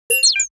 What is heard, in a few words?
high tech notification bleep